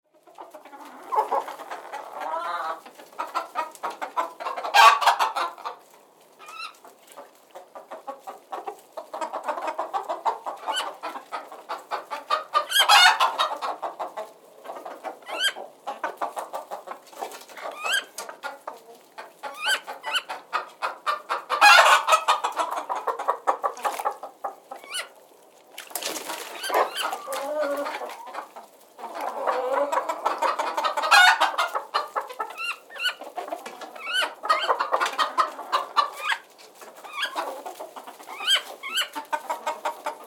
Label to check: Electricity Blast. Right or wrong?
Wrong